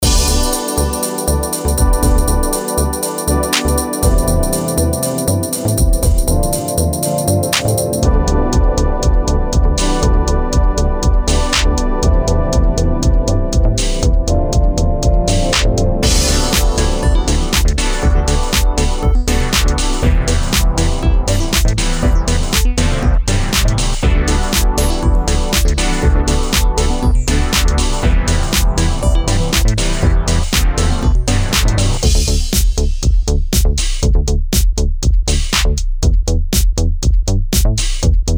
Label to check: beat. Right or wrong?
Right